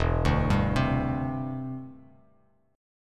E Minor 7th Sharp 5th, down, Bass Standard; arpeggiated

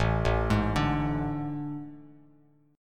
A Major 7th Suspended 4th, Bass Standard; AM7sus4; arpeggiated; JGuitar

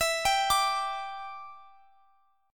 G 5th E, chord; G5/E arpeggiated

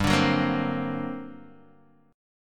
G Minor Major 11th, G, Guitar, DADGAD

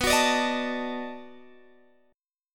B 7th Sharp 9th, down, Guitar, Open G

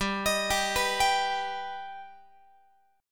G Augmented G Guitar; arpeggiated

